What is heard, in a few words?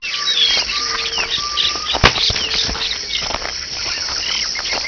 walk in woods